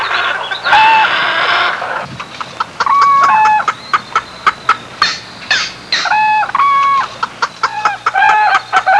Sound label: AVIARY, FARM